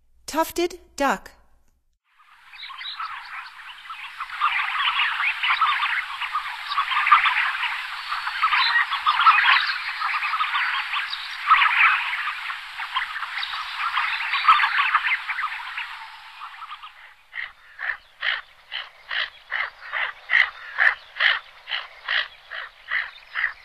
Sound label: Tufted Duck, Songs of Birds of North America; Stokes